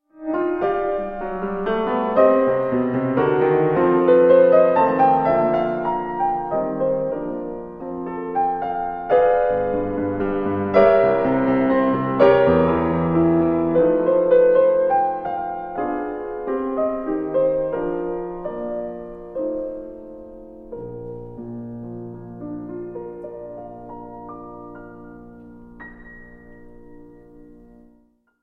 sonata for clarinet and piano in e flat major 2nd movement andante; felix mendelssohn